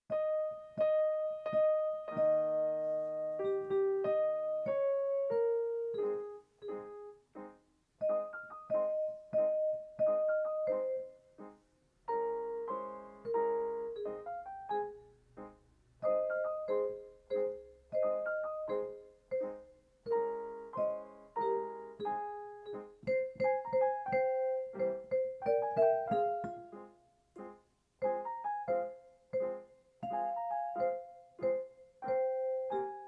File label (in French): Accompagnement lent